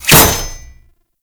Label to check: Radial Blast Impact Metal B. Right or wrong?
Right